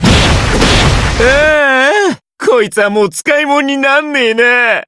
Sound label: victory; terumi